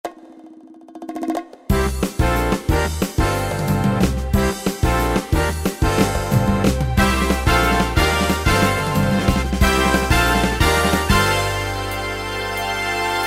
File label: 70s 8 Beat, Yamaha PSR-3000 pop & rock style